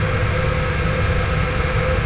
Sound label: outdoor, ambient air exchange